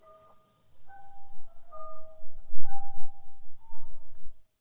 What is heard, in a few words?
Creepy Bells